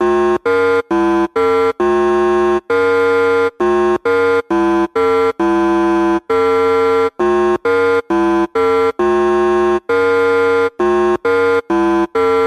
ate bit, Electronic Loops

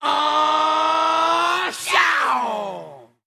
aw, Voice ooh ahh

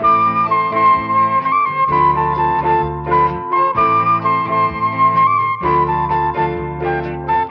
flute and guitar, Loops Phonky

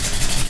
eraser

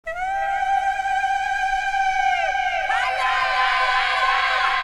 screaming orgasm; ooh ahh

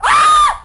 scream

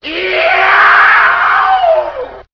scream cartoon pain; ooh ahh

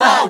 "woah" group; ooh ahh

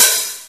Real Open, Hats

